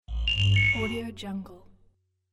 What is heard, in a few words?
scifi hitech interface; volkovsound